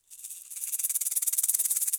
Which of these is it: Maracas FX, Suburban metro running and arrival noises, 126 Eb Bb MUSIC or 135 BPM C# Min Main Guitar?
Maracas FX